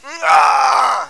Death Dwarf; Miner Max

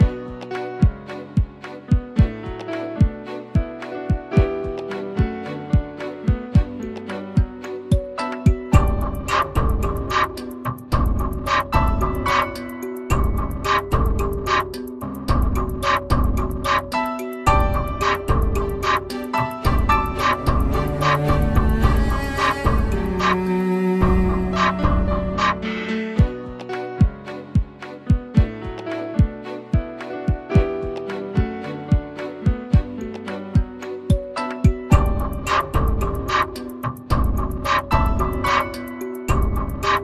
Lose; HTR+ Slot Car Simulation; MacOS PS Vita Windows

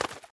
Bird Flap

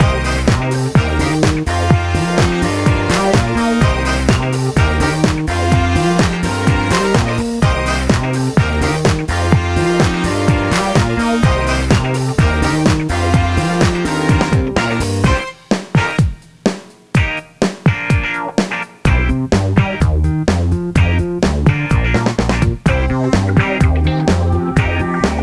Disco Boucle; Imagine Modern Dancer; Nintendo DS

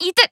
Emo Pain

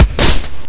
Beat loop, Eb0; Guitar Rock Tour

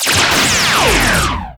Laystormlaser Shot; gamerip

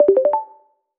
Button

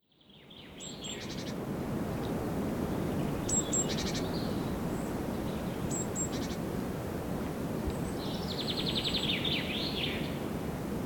Devilstower Forestbirds

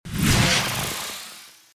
Powerup

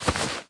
Browse Skin; Subway Surfers City Sound Effects; Android

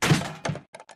Drop Control Pad; lethal company

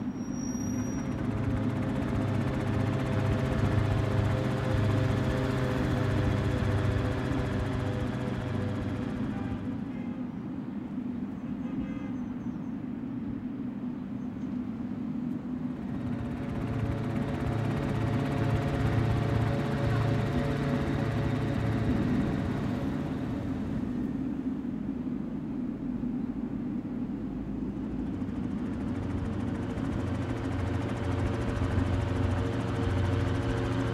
Ambience Window; Ambience Fizzle Window

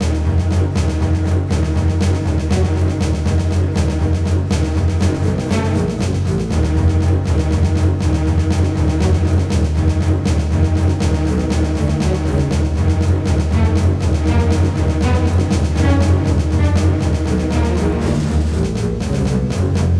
Boss combat; The Hobbit; PS2

